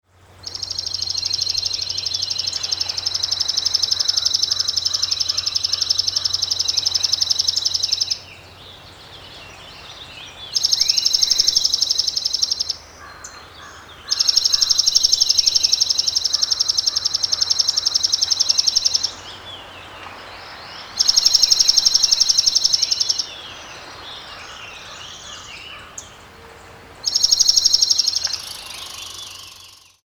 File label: DEJU Songs